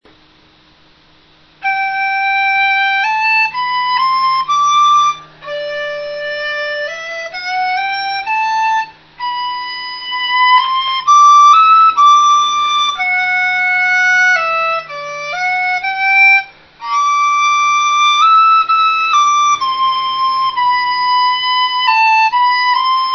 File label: dizi